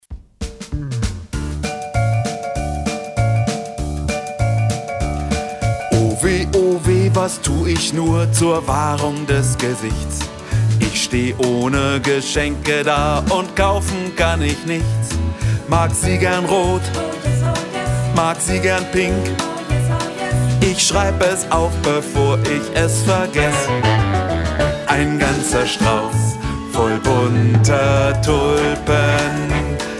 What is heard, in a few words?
Bass